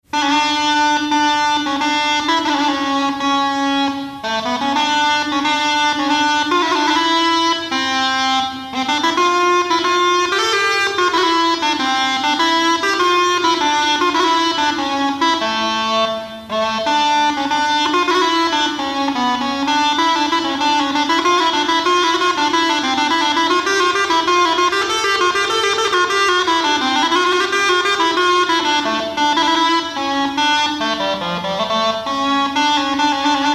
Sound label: Krumhorn; historia dychovych nastrojov